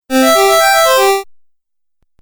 Game Music Phrase, Video Game Sound Effects

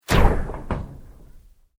Sweep Mechanical Fast

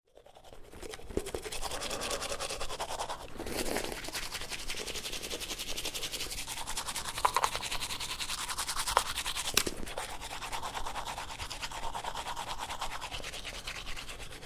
Brushing Teeth Toothbrush Cleaning Teeth Fast; Brushing Sound Effects

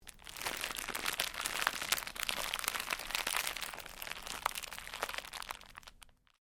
Cellophane crinkle

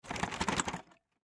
Box Shake Rattle; Package; foley; Shaking Sound Effects; Objects; Blastwave

